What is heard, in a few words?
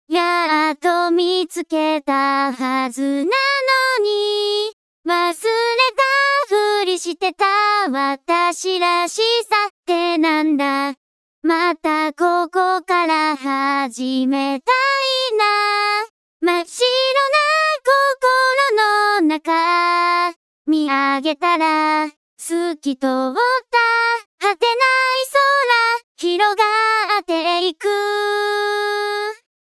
vocal